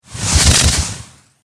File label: Magic Spell Burst, Horror, Magic, Spell, Burst, Impact; Blastwave